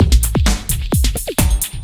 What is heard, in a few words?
Beat, Drums & Percussion; Electrocution; Beats